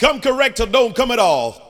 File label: VOX SUNG; Megabass Remix! 2